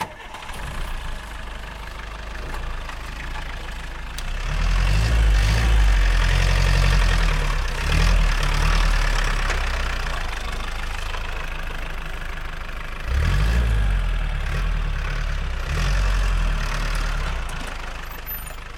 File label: Mulitcar Start